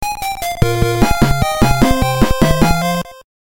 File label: Game Over